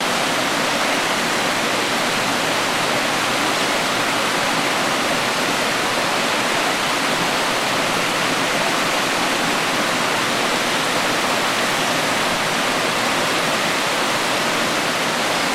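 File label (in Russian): водопад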